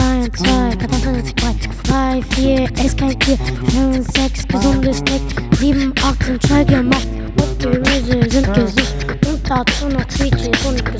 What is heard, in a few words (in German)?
Beatbox; Ernaehrung; Eins-Zwei-Kartoffelbrei